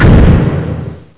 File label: stomp; Hexen II; golem